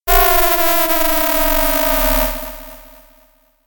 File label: Beam